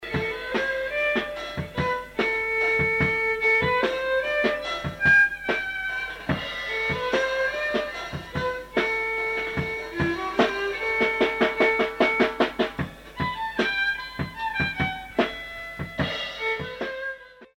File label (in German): Geige und Drums